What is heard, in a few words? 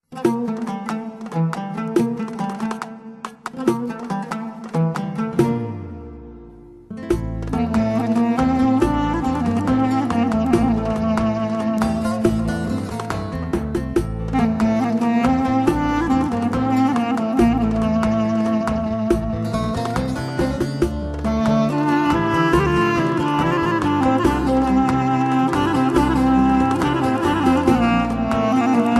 Nice Ringtone Turkis